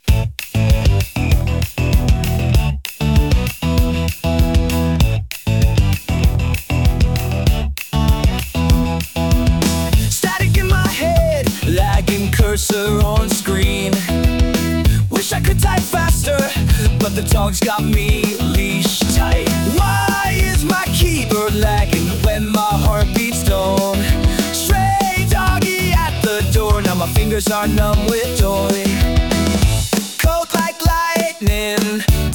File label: Lagging Heartbeats